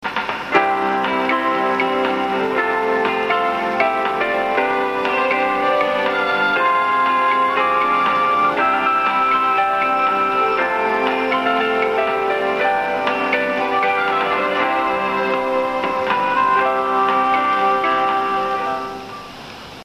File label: bus chime; ＪＲバス; 終着（放送前）